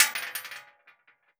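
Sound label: bullet case bounce 308 metal; gmod; viper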